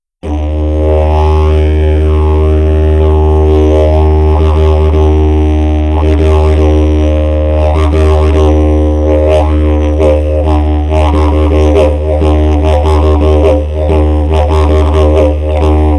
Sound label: didge; Ralf Gabler